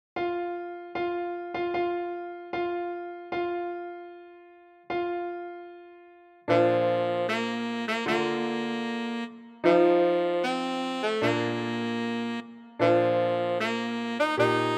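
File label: tenor saxophone, für Tenorsaxophon und Klavier; Schwierigkeitsgrad Klavier Level 2, leicht; Hochzeitsmarsch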